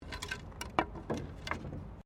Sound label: Wood Move; Material components for constructing more complex sounds; Wood (Sound Effects:Materials)